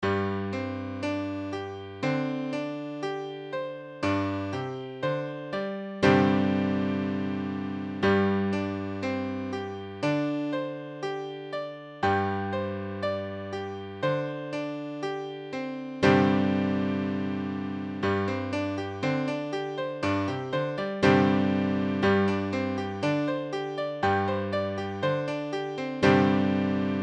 Gsus4, piano

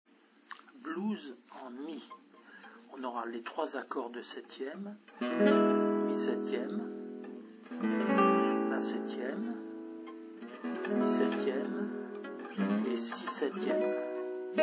blues, guitare

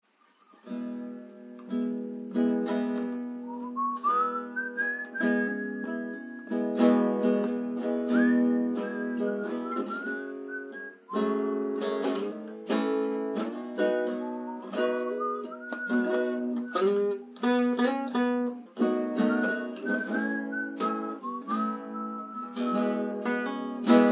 ballad, guitare

